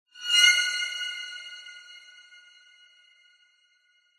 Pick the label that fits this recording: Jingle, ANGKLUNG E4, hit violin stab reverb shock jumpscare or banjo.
hit violin stab reverb shock jumpscare